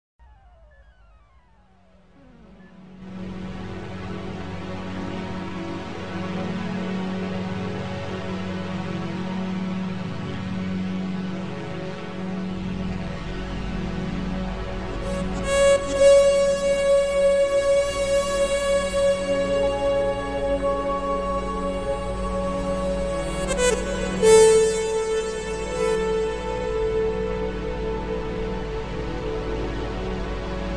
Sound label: blues